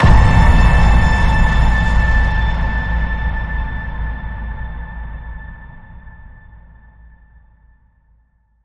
Ping Frequency A

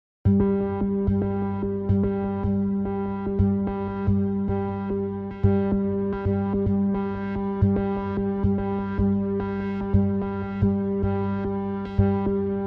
Low Synth Only

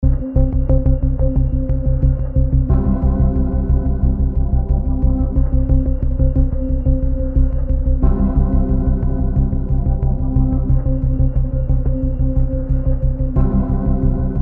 Warm Pulse

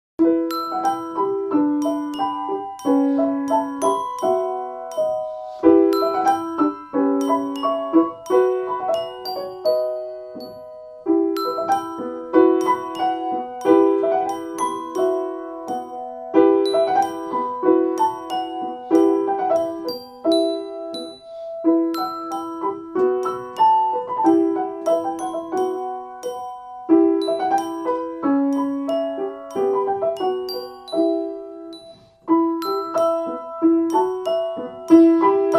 Music Box

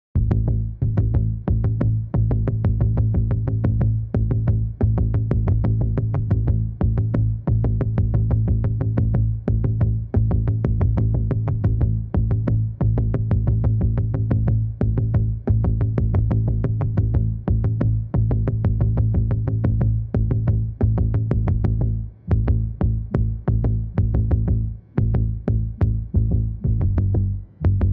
Drums and Bass

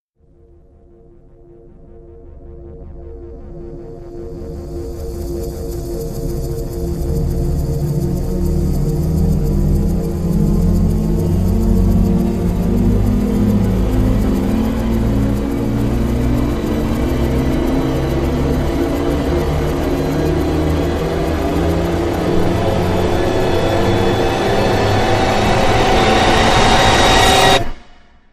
Rise

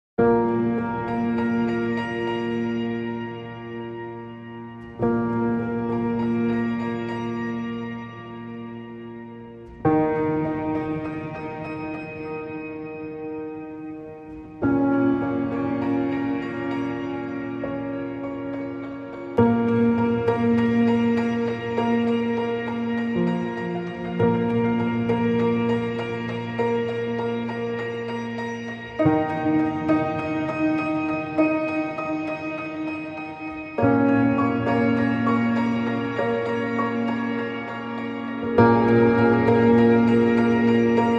Pianos

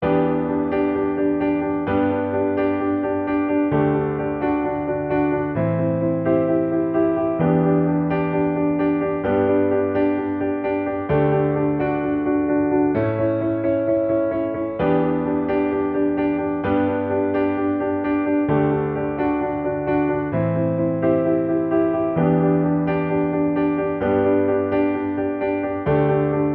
Piano

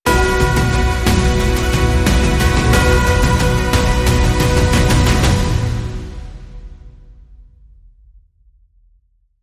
Sting No Choir

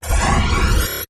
Turbulent Energy Sweep

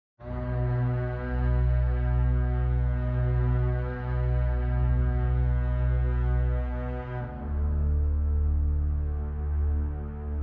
Legato Strings

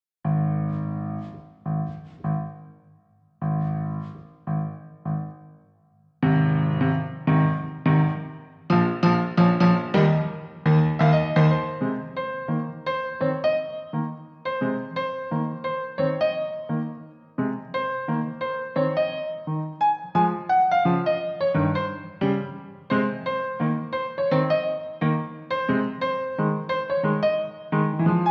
Piano

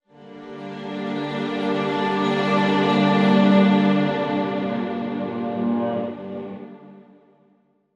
Link Sting

